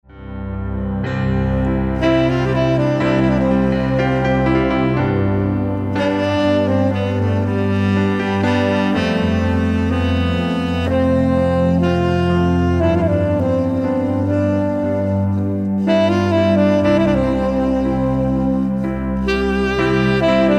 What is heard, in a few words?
Instrumental Sax Tenor